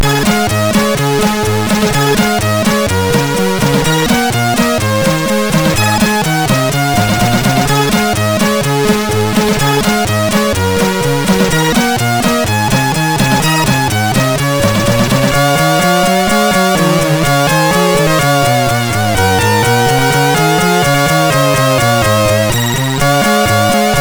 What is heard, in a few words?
Jingle Bells